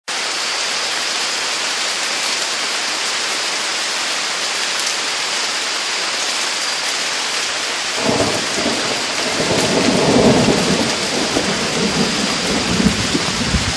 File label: Night Storm; Survivor The Interactive Game